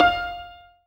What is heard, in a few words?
zimbal F 4